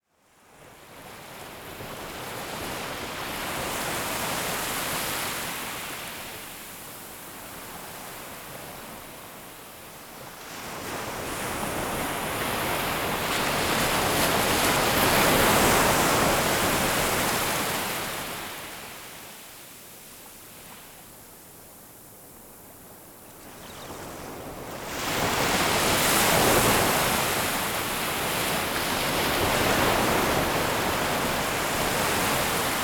Ocean Waves Sounds; New Age Ocean Sounds

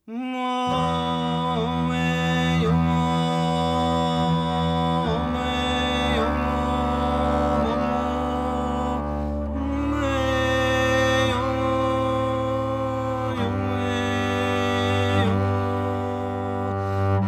Quartet

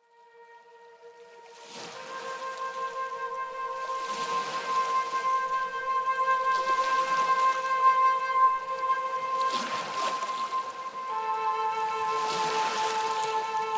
Relaxing Flute Music

